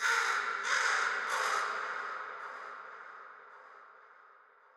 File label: crow, ambient, nature, birds; Portal 2